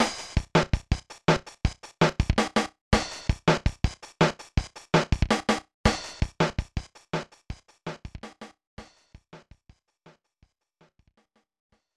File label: Pause (Without Echo); battletoads in battlemaniacs; snes